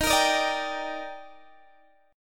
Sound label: Eb Major 7th, Guitar DADGAD